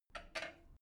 drain valve lever handle short, Badezimmer; haus garten

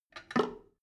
drain valve open regular, badezimmer

